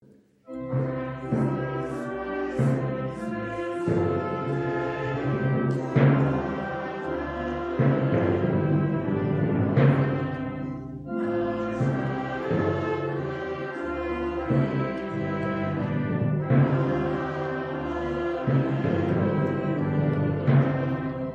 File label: trumpet